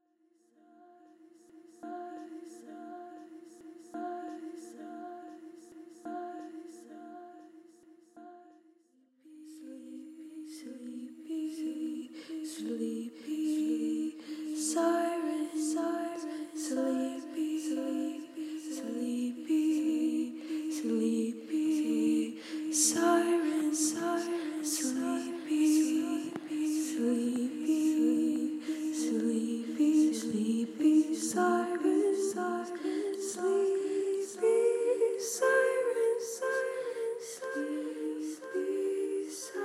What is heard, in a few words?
sleepy sirens